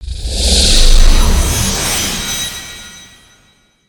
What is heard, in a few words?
teleport in; foley